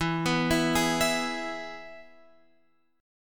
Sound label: E Major, Guitar, Open F; arpeggiated; chord; x,11,11,11,11,11